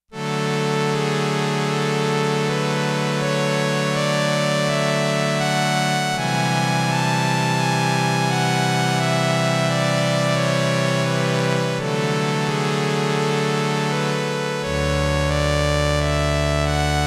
STRINGS I with slow attack & sustain, Roland RS-202